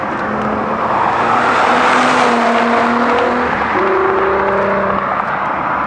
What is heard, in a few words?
driveby; mk4 jetta vr6